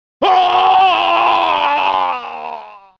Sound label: crashed scream; deception; liu kang